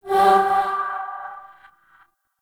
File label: Cast; Warframe; Warframes; Bard